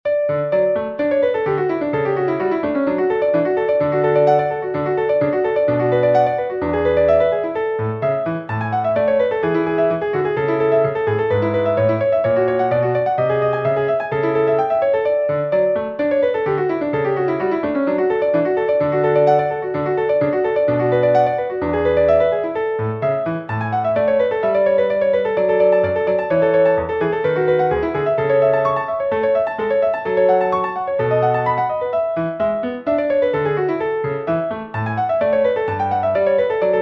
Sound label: Toccata in D major